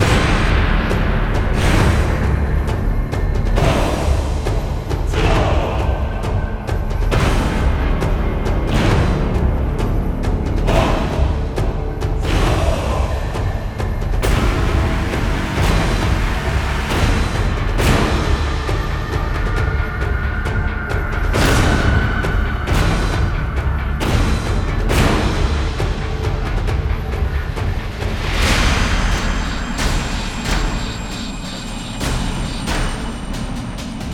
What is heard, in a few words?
Base Loop low